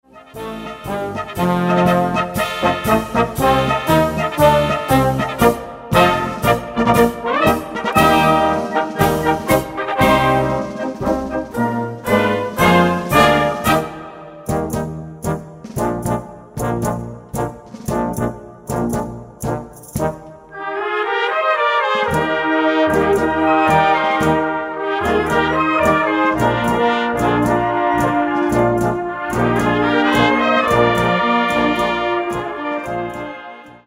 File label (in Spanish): Marcha; Argentina